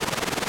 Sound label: reel Spin; twin spin mw